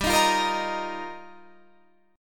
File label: G Minor 7th Sharp 5th, Guitar, Standard; strummed; capo 9